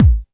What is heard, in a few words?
BD, Dance Mega Drums; Best Service